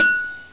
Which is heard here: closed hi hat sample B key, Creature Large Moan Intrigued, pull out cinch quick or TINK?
TINK